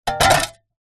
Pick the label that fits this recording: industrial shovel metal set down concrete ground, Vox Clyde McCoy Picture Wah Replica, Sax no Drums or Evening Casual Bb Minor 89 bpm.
industrial shovel metal set down concrete ground